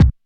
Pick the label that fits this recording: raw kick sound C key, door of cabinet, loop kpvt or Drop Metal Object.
raw kick sound C key